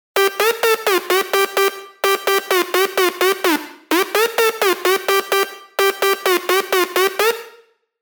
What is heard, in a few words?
LD, dirty dutch leads; sylenth1; We're So Back; revealed sylenth1 dirty dutch leads vol 1